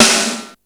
snare, Yamaha RY-8

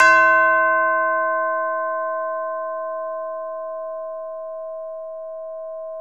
C3 CHIME, ORCH. CHIMES; Northstar - New Gold VOL-2

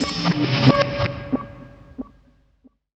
X MACH, MACHINES, Production Effects

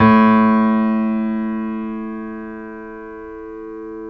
PNO Grand Pno; Club-50 - Foundations Roland

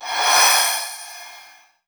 Cymbal Roll; Groove Elements

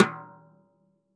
stickshot snares off; JazzFunkKit

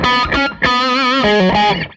Tele Guitar Loop

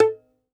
DOMRA, Plucked Strings

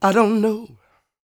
SING W, Vocal Planet Blues & Soul, BLUESY; ILIO

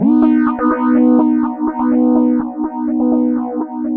Analog Rhythm

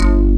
plug bass; Pro-Rec - Synthsonic; TRINITY

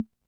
Tom, rhythm330; classic machines

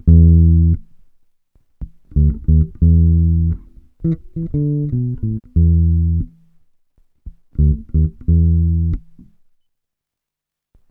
FUNK 88, Bass Grooves; USB Soundscan vol.30; AKAI

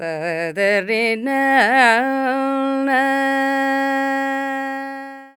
INDIAN SET; Spectrasonics; Heart of Asia

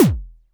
TOM UP, DRUMS; Music Store Sound Service; Einstein

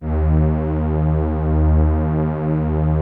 PROPHET 5 D#3, Synthesizer; Hollywood Edge - Giorgio Moroder Rare Synthesizer Collection

